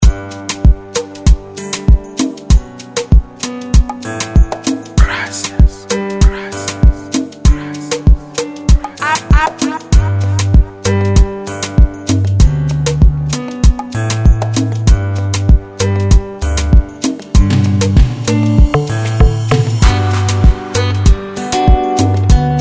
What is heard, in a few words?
Afrogroove, Afrobeat instrumental